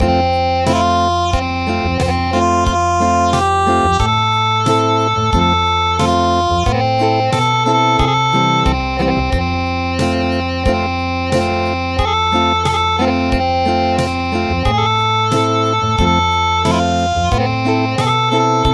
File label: Acoustic; A duck